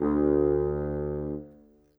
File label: Bassoon, Orchestral; Sonic Foundry (Sony Creative Software); Orchestral VOL-2; Modern 07